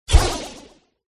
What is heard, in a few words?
taran attack contact bottom